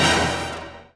Combo Stab; Bee Movie Game

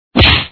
Good Punch; Dad 'n Me

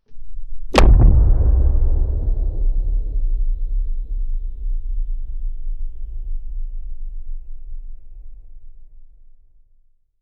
huge explosion blast

